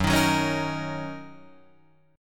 Gb 6th, Guitar Drop D

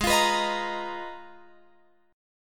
Ab Minor 6th Add 9th, Guitar, Open F; strummed; x,15,17,18,18,17; down

